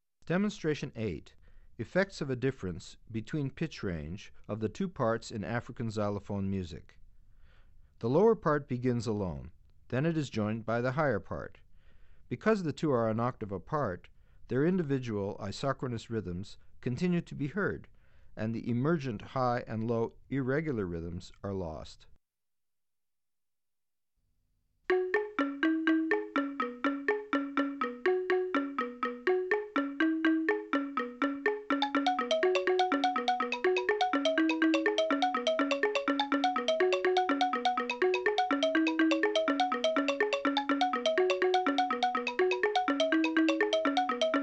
Effects Of A Difference Between Pitch Range Of The Two Parts In African Xylophone Music; Bregman ASA; auditory demonstrations